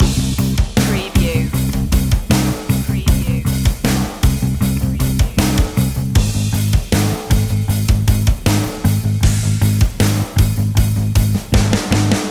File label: drama heavy loop